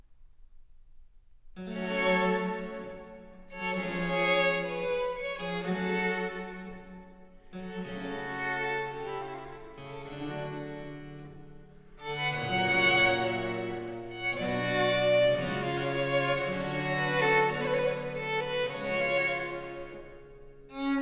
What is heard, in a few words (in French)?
Pièces de violes, Suite in G Minor; Book 3, No. 41-44; Nicola Matteis; Ayres for the Violin; Quatrième Livre (Paris 1717)